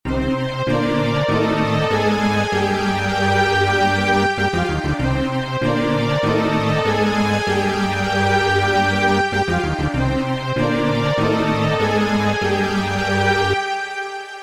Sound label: String 1; emu mo phatt